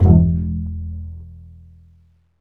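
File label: strings, contrabasses pizz; String Master Series; Cbs FX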